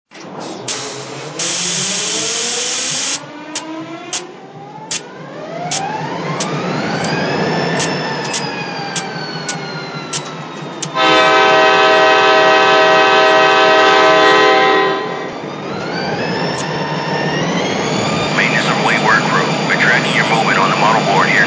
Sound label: GP40, trains